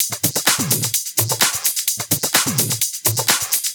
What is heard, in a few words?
Houseloops 128BPM; Vengeance Samples